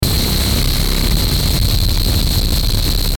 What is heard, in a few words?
Distortion Hard Analog Static Burst; Blastwave